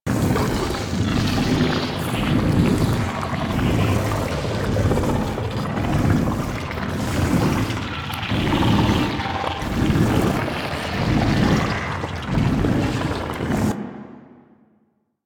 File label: Pulsing Infested Blob Big Loop; Warframe; Ambience; Nineteen Ninety Nine